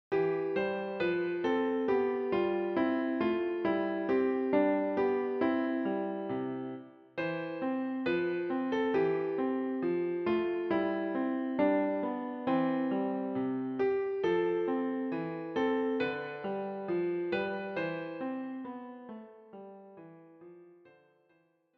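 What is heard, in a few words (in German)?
Joy to the world Klavier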